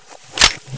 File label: desert eagle mod to ironsights, weapons